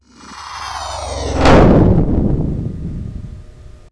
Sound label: gate from island